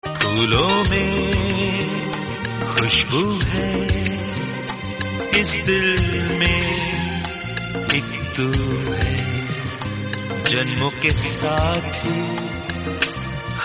title track

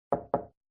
knock knock samsung

